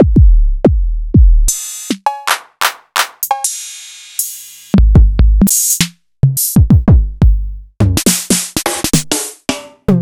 EMU Proteus-2000

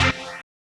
HOUSE, House Dance

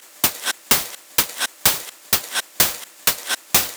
Hihat 127bpm, House Drumloops

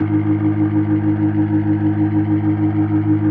G#1F, B3, Vintage Keyboards

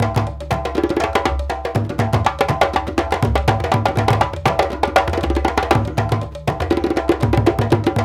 African Contemporary, Lamba, 120; Spectrasonics; Supreme Beats

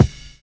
SNAP; Univers Sons - Kitch 70s

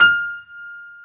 GRAND PF; B-GRAND PF

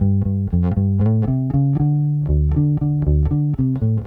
Bass, 70s Analog Funk; Sonic Foundry (Sony Creative Software); What it Is! 70s Analog Funk

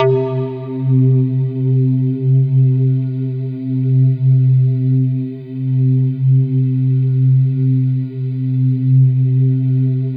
C4, Synth; 90 s Sample CDs; NiceBeats; SynthLine VOL-23

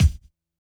kick, Hardcore Breakbeat, 134 Emin; Big Fish Audio; Hardcore Breakbeat Action; Mellow Jack

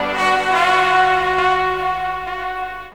TROMBON FX; Ragga & Dub Reggae; BRASS KIT; AKAI; USB Soundscan vol.27

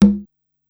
Conga Low, Korg Wavestation-SR, Drum Kit; Wave 455 - Drum Kit

